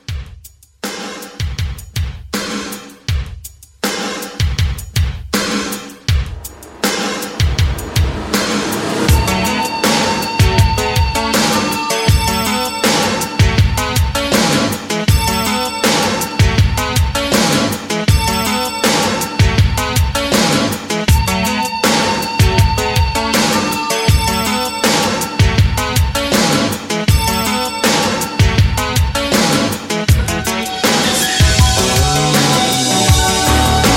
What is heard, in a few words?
80s RnB Re-Drum 80